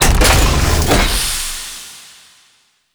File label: Apartment Door Open; Hub; Warframe; The New War; Zariman